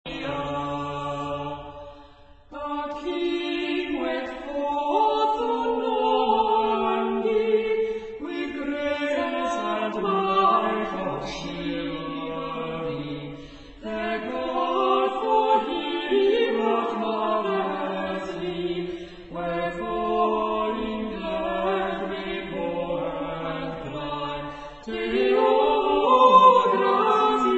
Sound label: Medieval Carols; Authentic Medieval Music